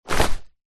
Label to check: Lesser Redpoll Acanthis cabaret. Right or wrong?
Wrong